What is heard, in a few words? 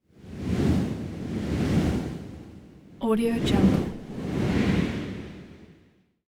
cloth whooshes whooshes pass bys; transitions and movement